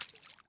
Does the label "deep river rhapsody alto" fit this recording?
No